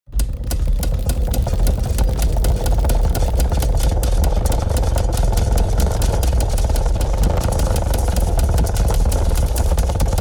Rotating vintage mechanism sequence; steampunk; gfxsounds

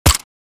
Tactical assault rifle suppressed shot

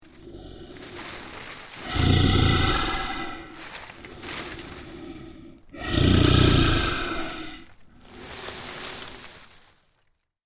Bear movement and growls

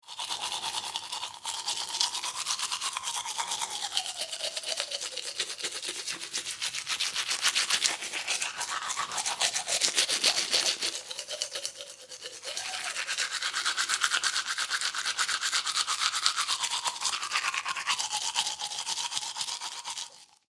Teeth brushing quickly woman

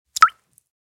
Water droplet plop; Liquid

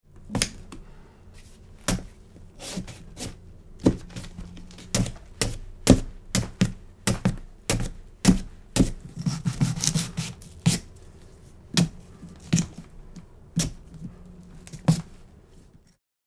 Halloween pumpkin carving

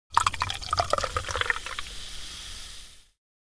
Champagne pouring into crystal glass fizz

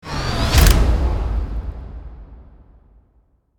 Cinematic camera flash shot

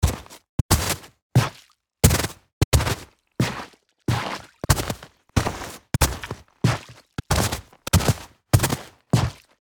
Footsteps on snow boots walking

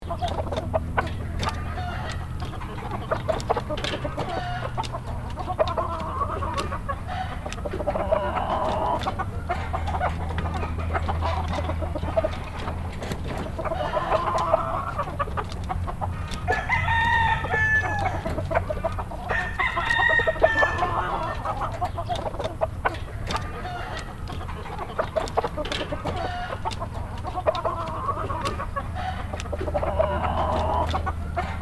Farmyard chickens ambience

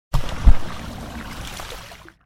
Diving into water underwater perspective

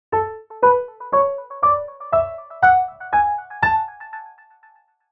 Synth and piano A major scale

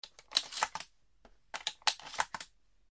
Winchester 12 loading

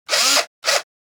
Electric drill quick drilling short

